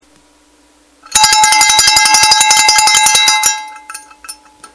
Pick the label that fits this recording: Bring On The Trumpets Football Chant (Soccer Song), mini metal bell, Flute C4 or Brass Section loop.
mini metal bell